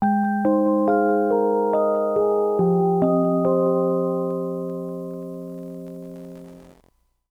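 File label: JINGLE SLEEPING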